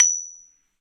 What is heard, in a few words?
Hole Saw; Hole Saw Bits